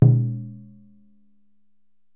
djun djun, African Perc; E-MU Producer Series Vol. 7 – Old World Instruments

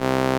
SOLINA, String Machines

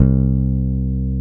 BAS; Best Service Gigapack 2

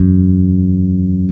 BASS OVER, Electric Basses; E-MU Producer Series Vol. 8 – Platinum Phatt

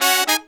Horns, 110BPM

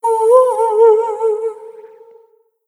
Frailty, vocal samples; Sonic Foundry (Sony Creative Software) - Pocket Diva World Class Vocal Samples; Op-Erratic Loops

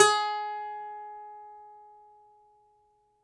DOMRA; E-MU Producer Series Vol. 7 – Old World Instruments

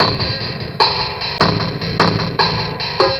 Beats, Synchro Funk; Junk; Sonic Foundry (Sony Creative Software)

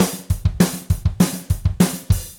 Gretsch groove 90 BPM, 1967 Gretsch Kit drums

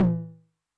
HI TOM, High Tune Long Decay, 909; classic machines

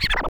SCRATCHES; Scratch 1

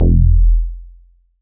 bass F1, Roland MKS-80

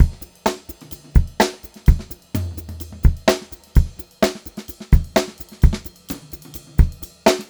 128 GR VAR, Funk Groove Drumloops; USB Soundscan vol.38; AKAI; 90 s Sample CDs; 128 GROOVY

